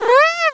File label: Meow